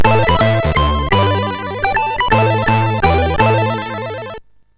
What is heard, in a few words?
Jingles, sid MOS6581R2